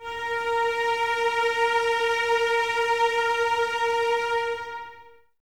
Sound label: CES A#4, String Ensembles, Cellos, Expressivo; Miroslav Vitous